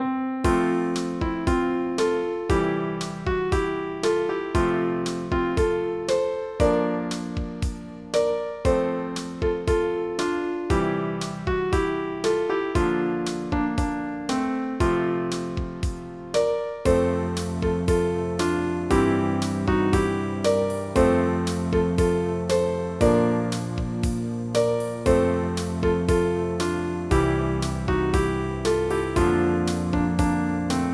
AULD LANG; MacCapture

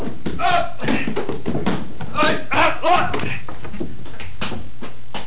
FALL; Internet Pratique 01

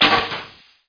stake Gun; vampire demo

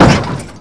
crash wall; Stunt GP

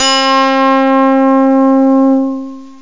707 midi stack

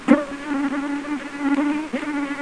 BUZZ; PUZMANIA

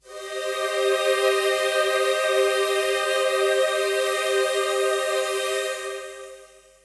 Pad E1; Techno; Melody; TechnoMusicMaker

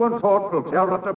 walla; DAoC; Toa; Gamestar

